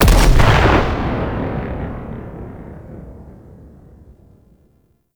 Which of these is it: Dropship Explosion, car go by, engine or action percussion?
Dropship Explosion